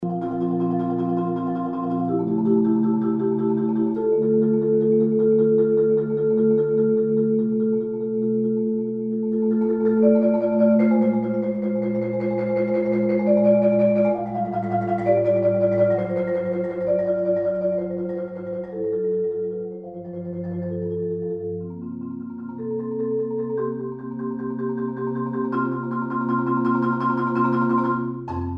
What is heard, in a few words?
marimba